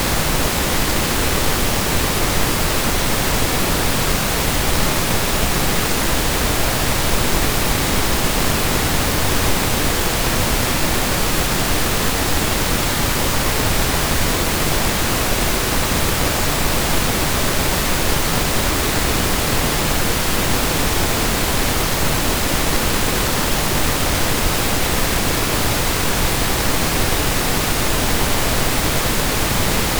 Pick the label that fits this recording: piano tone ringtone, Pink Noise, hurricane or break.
Pink Noise